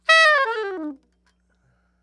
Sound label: SOP SHRT D#5, saxophones, SHORT FALLS; Greytsounds - Philip Wolfe David Crozier's Saxophones